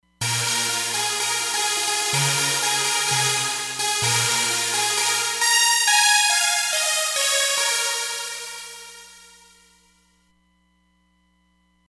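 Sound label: Fragile Saws, synth; Frgile Saws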